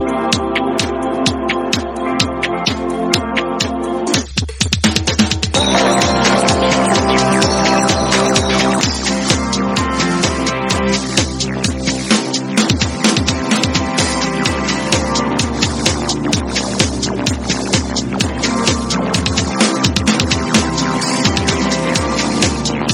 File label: 2step